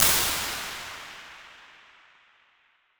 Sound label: clap